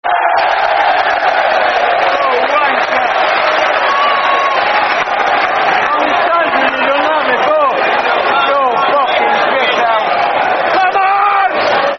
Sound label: Football Chant, Soccer Song; Top Swearer; Sheffield Utd Sufc